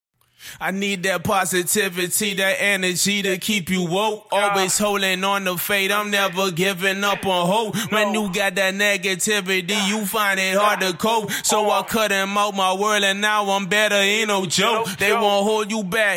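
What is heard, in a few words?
hip hop 135 A minor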